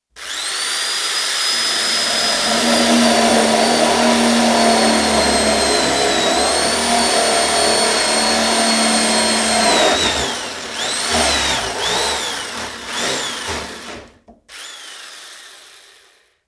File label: Electric drill, single hole in wood, jams, is freed; BBC